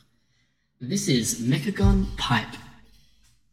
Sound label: pipe; mechagon; Blue Yeti X World of Warcraft Edition